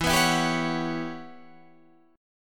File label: E Minor 6th Add 9th guitar chord, Standard; strummed